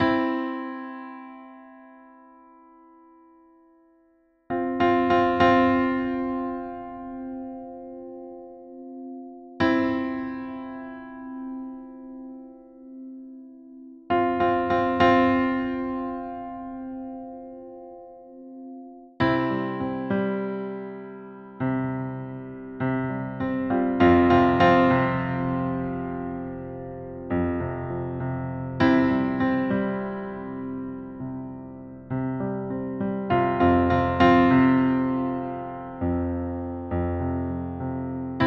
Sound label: floating through the sine waves; marty thinks 4th dimensionally macos windows gamerip 2017